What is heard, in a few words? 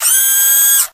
sentry move short; tf